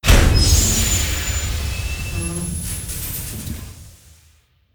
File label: Harvester Ramps Down